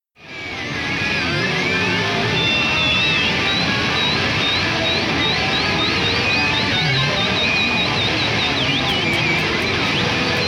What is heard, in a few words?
guitar